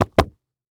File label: Foley Footstep High Heels Rug Carpet Jump; Foley Footsteps